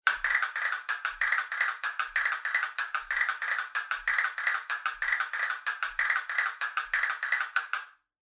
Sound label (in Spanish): castañuelas granadillo veteado